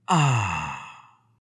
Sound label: contented refreshed sigh; soundscrate